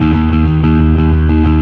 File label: Fuzz D sharp 2; Torchlight III; PS4